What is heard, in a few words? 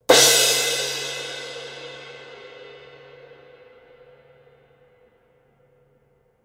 Kerope, cymbal sound